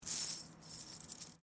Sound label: Coin Penny Multiple Move Stone; Common coins; Money; Objects